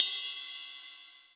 ride bell, Yamaha TG-500

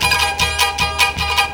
TANBURA; Best Service; World Colours

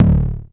TOM, Dual Percussion Synthesiser; Klone

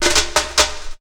drumbreak, 130; Ueberschall; Techno Trance Essentials

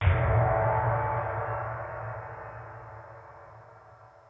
gong, Kurzweil K2000

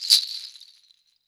BEADS SHOT

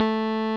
Roxichord, E.Piano

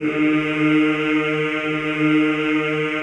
CHO, Mix-Combi; Sound & Vision - Gigapack I CD 2 (Roland); Combinations

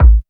kick techno, drums; Techno Trance Essentials

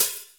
Open Hhat, Hihat; Equipped Music; Loungin House

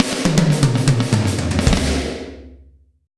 120 BREAK, DRUMS

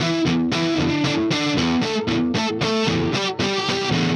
Melody Riff Guitar, Indie Rock; Sonic Foundry (Sony Creative Software); Indie Rock Anthems; Session 06 - Haze

